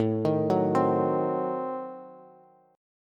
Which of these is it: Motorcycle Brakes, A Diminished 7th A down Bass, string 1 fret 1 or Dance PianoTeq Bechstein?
A Diminished 7th A down Bass